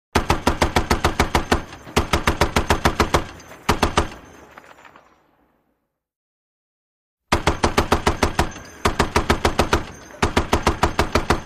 .50 Caliber: Long Bursts With Shells Dropping

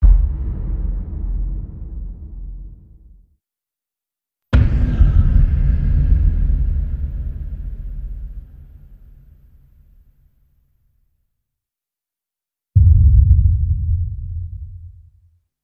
Deep Drums

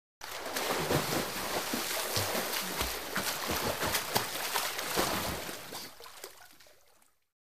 Swimming Lap Stop

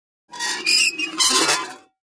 Metal Scrape On Metal

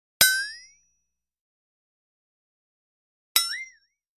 Metal Twang - 2 Effects; Metallic Bouncy Twangs.

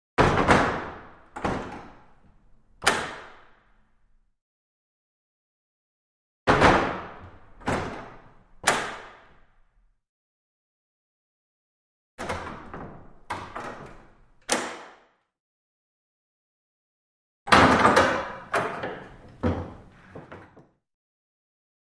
Large Wooden Window; crash slams

